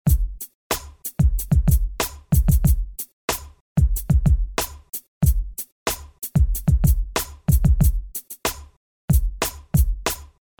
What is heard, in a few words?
kit